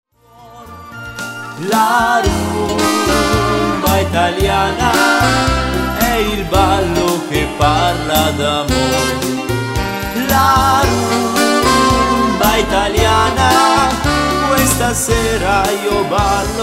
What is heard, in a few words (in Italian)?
rumba italiana